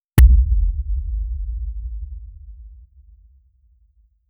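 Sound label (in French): son de logo très court; minimalist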